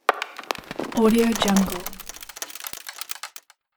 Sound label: breaking into fragments